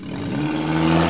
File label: Car